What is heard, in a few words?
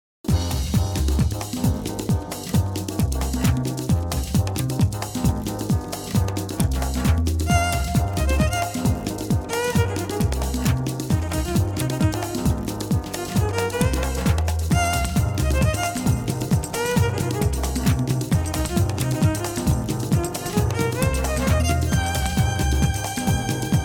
Jazz Funk, violin